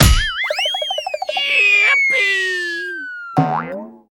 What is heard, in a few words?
kick; Golden Crayon Sounds